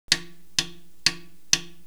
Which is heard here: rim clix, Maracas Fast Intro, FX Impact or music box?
rim clix